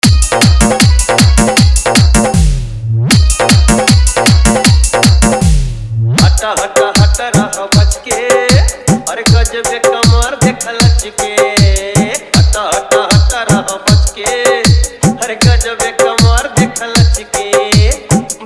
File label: Old Hit Song Full Hard Bass Mix